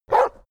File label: border collie bark strained; natur-tiere; hunde